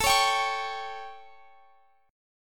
A 7th Guitar Drop D, chord

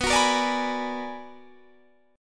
B-Minor Major 13th, Guitar Half Step Down

